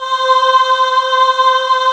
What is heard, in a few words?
choir